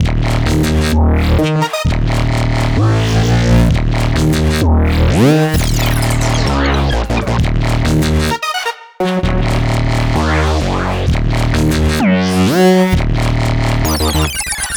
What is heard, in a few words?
Bias D#m Full Mix, Complex Electro Synths; Prime Loops